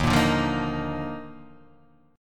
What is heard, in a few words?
D sharp Major 7th Suspended 2nd D sharp, chord; strummed; x,6,8,7,5,5,5,x; JGuitar